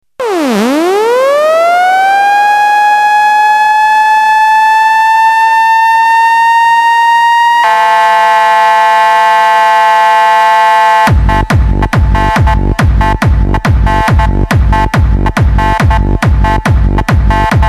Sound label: Trance Alarm